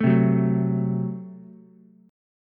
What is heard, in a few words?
Gb Diminished, Ukulele, Gbdim chord; strummed; JGuitar